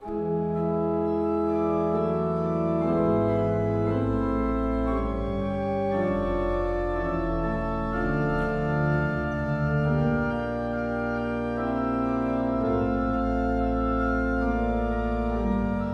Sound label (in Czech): Principal 8, Oktava 4; Vsemina